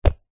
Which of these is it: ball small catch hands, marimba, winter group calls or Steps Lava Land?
ball small catch hands